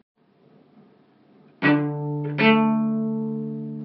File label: eighth notes